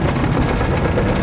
coaster track; Tony Hawk's Project 8; XBOX

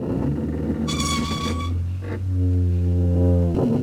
Wind Mill Loop; Cars; XBOX; Ambient

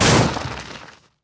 WRECK, Vehicle

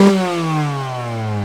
idle blip; YAMAHA

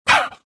gasp; Star Wars Jedi Knight II Jedi Outcast; XBOX; desann

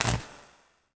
Electric Spark; Incidentals; Mace Griffin Bounty Hunter; XBOX

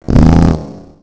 pipe; The Elder Scrolls III Morrowind GOTY; XBOX

